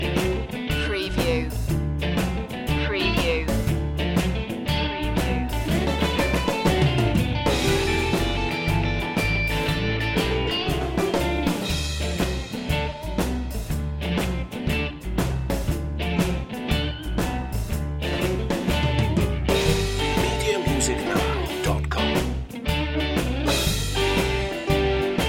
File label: shuffle off loop